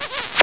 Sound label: smooch